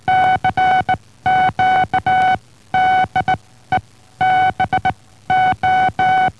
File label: spark